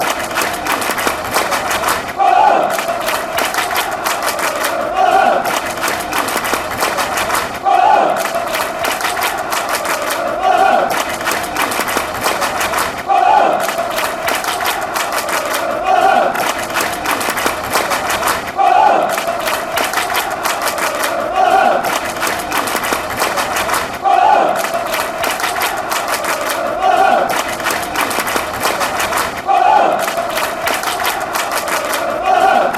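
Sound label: clap fulham, football songs and soccer chants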